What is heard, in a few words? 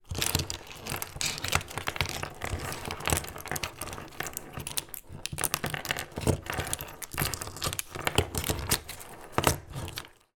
technology leads tangled players x 2 movement on wooden table